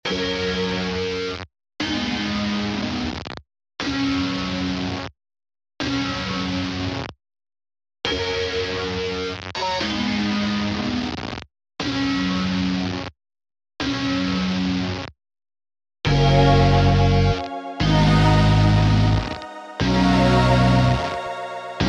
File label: 120bpm F maj